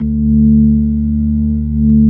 ORG B3, organs; Sound & Vision; Gigapack I CD 2

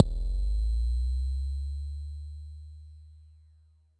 Kicks, Elektron MachineDrum; SPS1 MKII

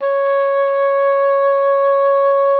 OBOE1, C#5; Xsample VOL-6

